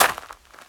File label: walk gravel; Lucius